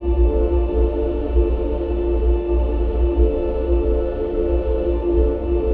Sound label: Tonal Ambience; Cymatics; Nirvana